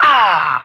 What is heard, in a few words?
"ah"